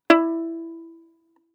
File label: Violin pizz ff sul D E4; MIS Pitches - 2014; Strings